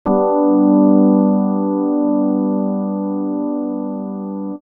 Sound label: Digi Pads; Jungle Frenzy 1